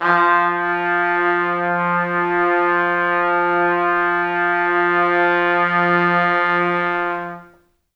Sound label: F2 -L, Pop Brass; Best Service; ProSamples vol.19; AKAI